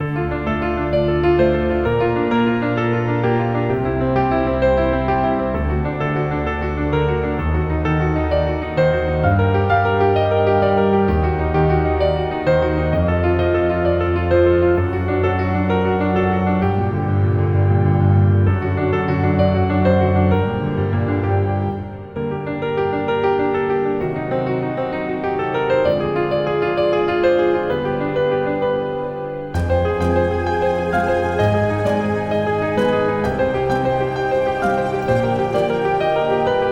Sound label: Boss Start; Death's Door; Old Crow Boss Start